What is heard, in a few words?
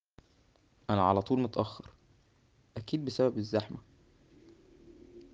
traffic